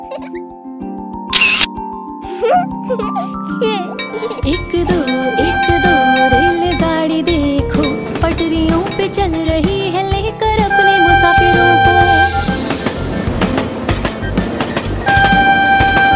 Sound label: funny cartoon music ringtone